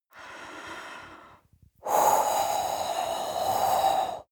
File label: breath female blow heavy